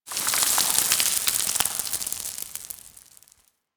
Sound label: Rock Crumble; Warframe; Quests; TheNewWar; Ambience; Drifter Camp